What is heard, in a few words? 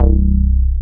Synth Bass, Roland